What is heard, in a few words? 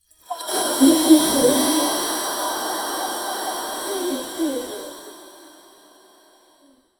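heavy fishing net being pulled, fishing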